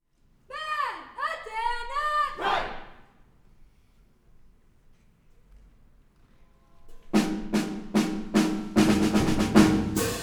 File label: Spring Concert Fight Song; CHS